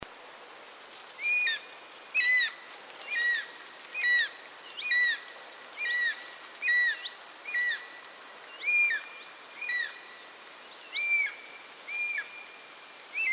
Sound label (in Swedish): Ormorn